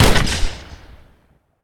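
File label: Weapon 25mm grenade launch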